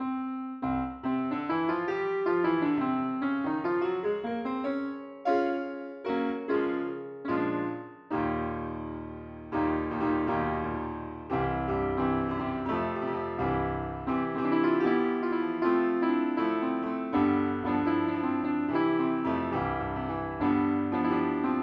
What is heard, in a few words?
piano